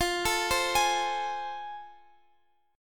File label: A Minor Major 7th Sharp 5th, F